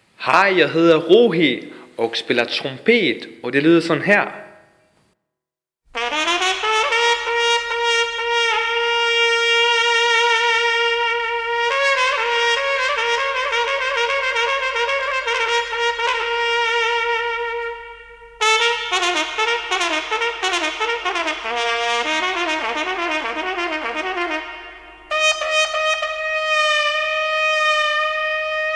trompet; prinscalibutsrejse

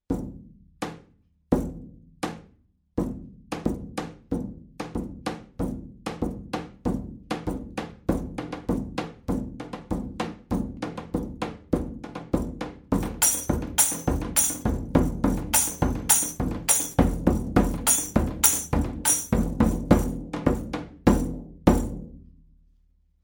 baraban tromme; eastwind

